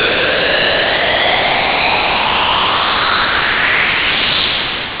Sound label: tube noise